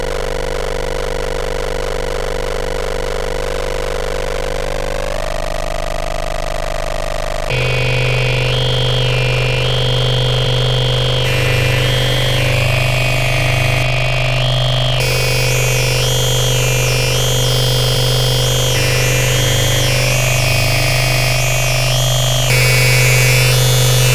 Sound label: dial; telephonebrain; dial filth; stopping